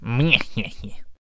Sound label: Ball and Chain Attack Vocals